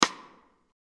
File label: Rimshot